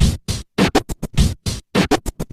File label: scratched to all hell, drum loops